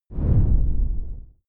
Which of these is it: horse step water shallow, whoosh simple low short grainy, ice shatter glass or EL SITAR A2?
whoosh simple low short grainy